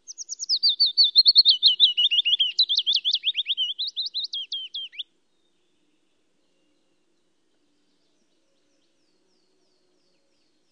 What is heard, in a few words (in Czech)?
budníček větší